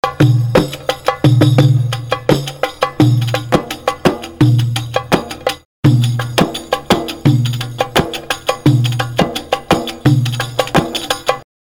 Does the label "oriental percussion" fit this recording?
Yes